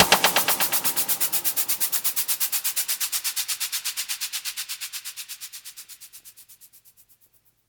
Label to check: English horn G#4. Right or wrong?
Wrong